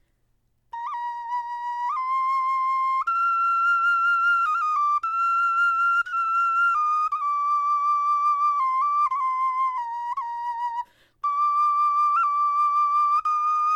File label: melodie, knochenfloeten